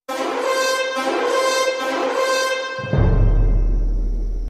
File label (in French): musique intro; kaamelott